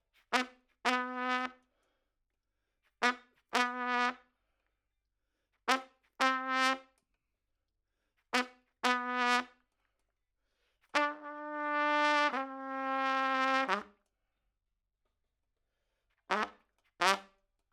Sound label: Trump